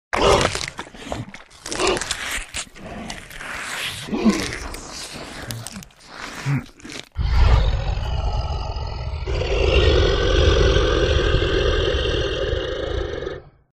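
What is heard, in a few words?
monster eats flesh growling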